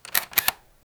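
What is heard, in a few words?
old photo camera taking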